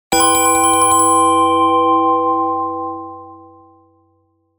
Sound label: jingle bell